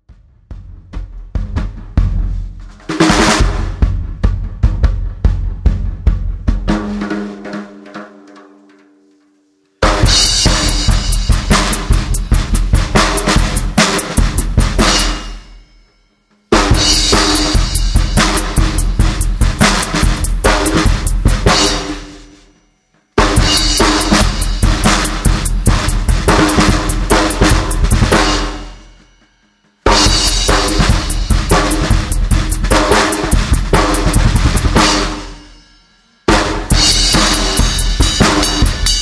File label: acoustic